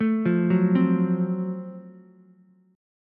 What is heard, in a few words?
F sharp Minor Major 7th Flat 5th, Ukulele; arpeggiated